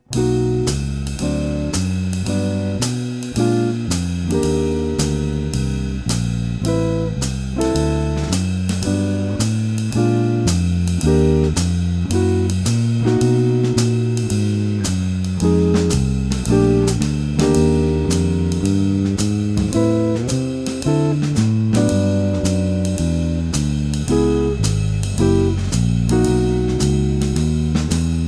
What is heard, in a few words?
Taps, walking bass